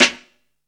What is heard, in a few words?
little, snares; Zero-G; Funky Elements